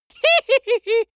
dwarf laugh

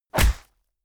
stylized fist punch

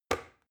plastic button start push heavy

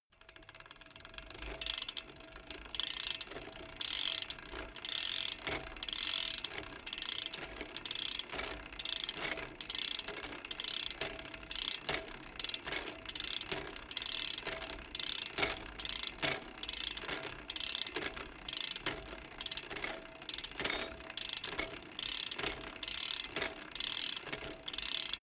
bmx bike pedal once freewheel short

